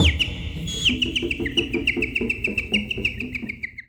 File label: WildFX, African; Quazibeat; African Secret; SFX